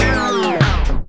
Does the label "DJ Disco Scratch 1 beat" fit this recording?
Yes